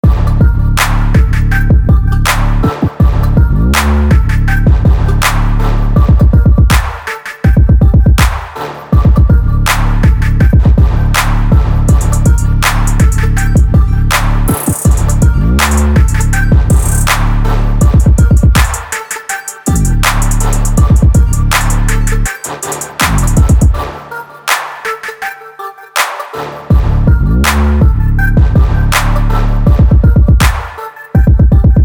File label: 81 BPM